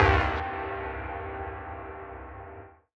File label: Analog Stab Eb, 138bpm; Zero-G Sound Sense Grime Scene; GS Construction Kits; Da Suspects; 90 s Sample CDs